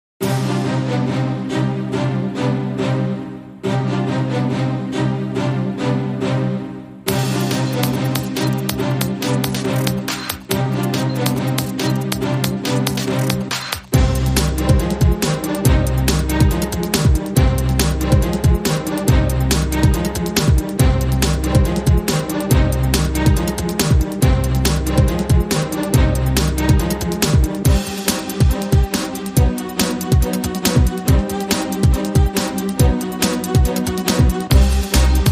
No Choir No Brass

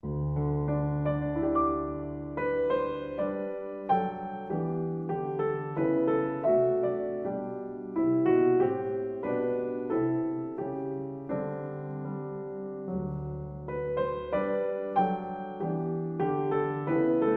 Solo Piano

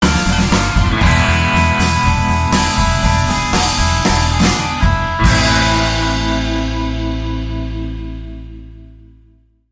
Alien Surfer

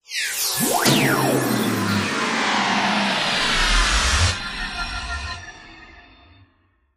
Nebula Tone Shift Down

